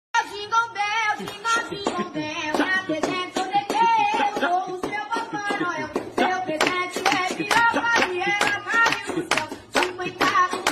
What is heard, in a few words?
remix brazilian phonk music ringtone